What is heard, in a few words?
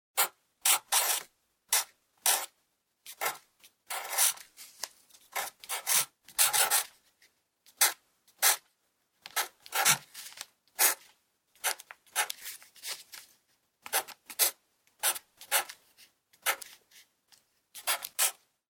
Pencil (Write)